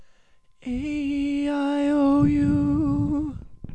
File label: aeiou High